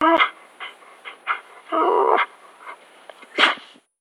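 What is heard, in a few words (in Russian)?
собака поскуливает и в конце чихает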